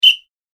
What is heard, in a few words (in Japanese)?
笛